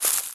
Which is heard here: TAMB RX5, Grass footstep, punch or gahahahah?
Grass footstep